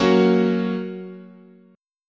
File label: F sharp Major, guitar chord